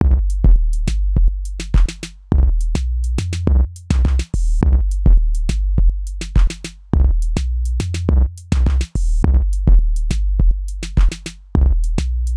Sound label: Drums and 808 bassline Loop 104 bpm